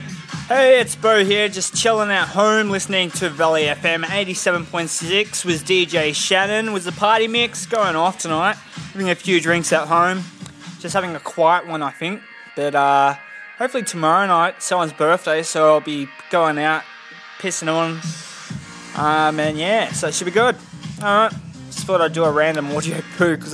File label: random boo hoo